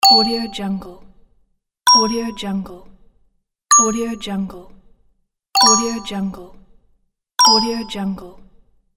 bell ding

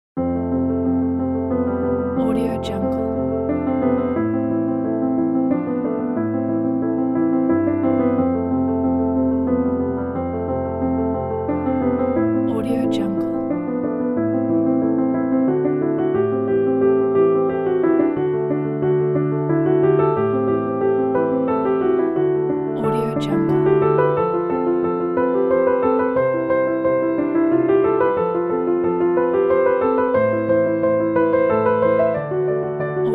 hopeful piano

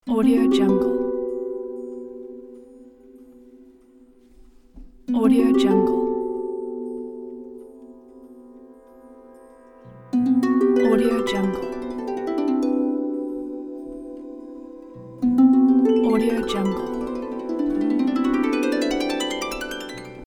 harp

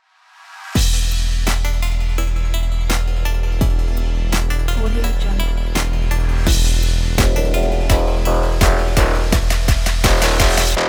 sport motivational trap

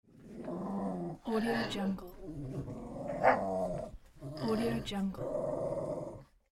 dog growling

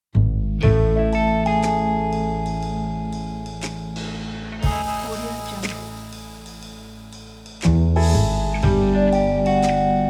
spy agent; audioagent

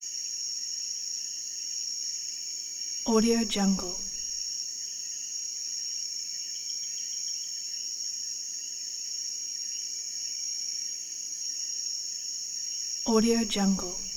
cuban frogs after rain; prosoundfx

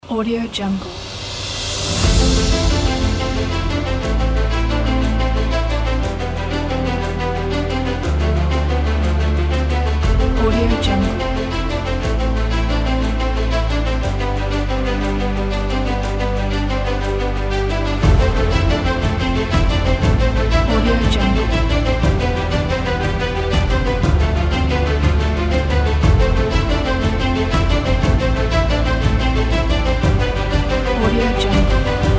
epic

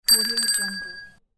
bell

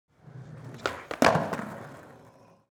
sport skateboard indoors drive kick away

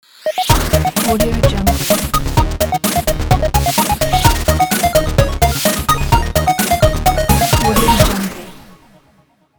8bit chiptune logo

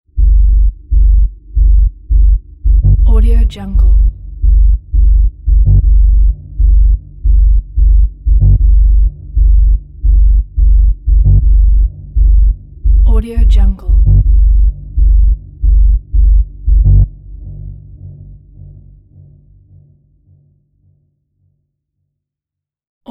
bloody nightmare horror pulses; soundmorph

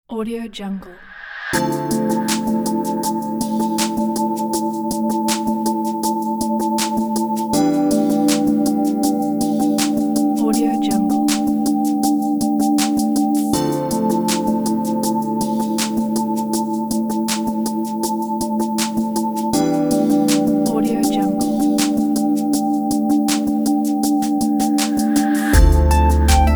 ambient background improvisation; gamma; studioetude

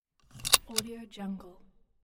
fasten seatbelt in plane; cinemandre

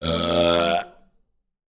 male burp

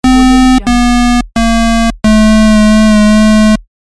game over

